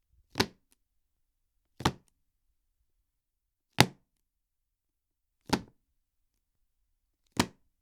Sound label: Book Put Down